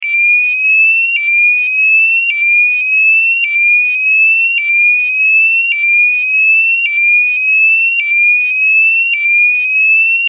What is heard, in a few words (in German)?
Ton